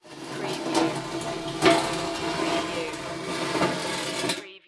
Trash Can Lid Drag

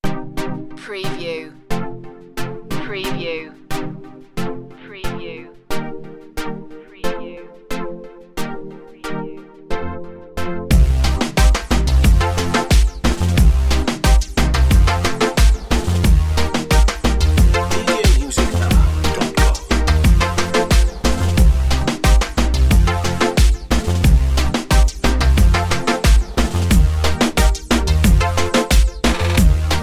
reggaeton underscore

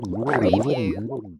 Gurgle, Comedy Drowning Gurgle

